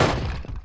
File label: kit fall